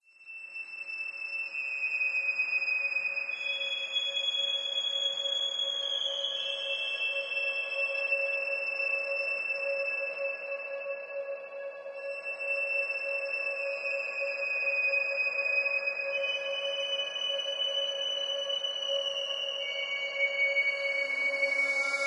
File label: Village Sting; ether one redux gamerip 2015